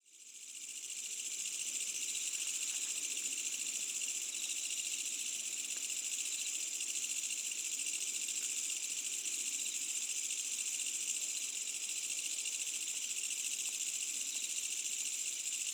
AMBIENCE Insects Medium Night Summer Distant Village, Asian Countrysides; SmartSoundFX